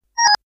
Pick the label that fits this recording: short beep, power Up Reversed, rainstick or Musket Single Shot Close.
power Up Reversed